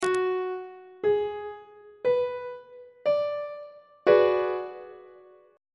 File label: a flat half diminished 7th 3rd inversion on treble clef